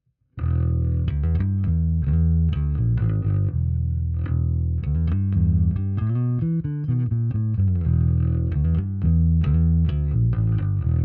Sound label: flat SVT410E sm57